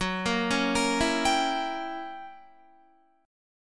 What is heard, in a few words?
Gb 7th Flat 9th Gb Guitar; Gb7b9 arpeggiated